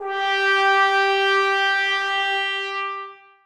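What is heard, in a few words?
trombones sustain g2; Sonatina Symphonic Orchestra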